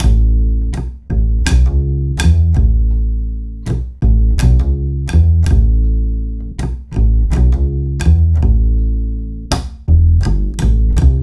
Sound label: BASS TASTER; Sonic Foundry (Sony Creative Software); Drums from the Big Room The Mixes; Demo Projects